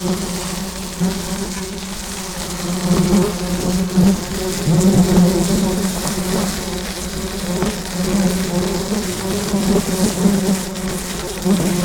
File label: flys black; animals; best service Blue Box